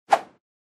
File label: Motion Swish